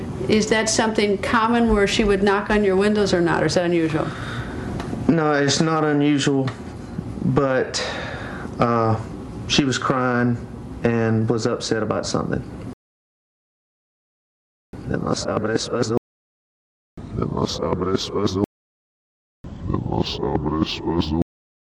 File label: crying